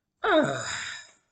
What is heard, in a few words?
ahhhh relax